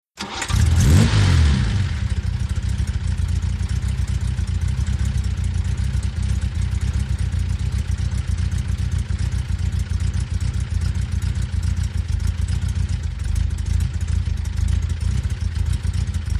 VEHICLES ASTON MARTIN: EXT: Start, idle, rev, switch off